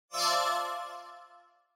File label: Multi Accent Short